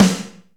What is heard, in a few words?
Reverb Kick Snare; PS-37 Dance Synths; Percussion